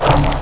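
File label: select menu; amphetamine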